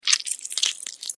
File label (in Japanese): クリーチャーが食べる音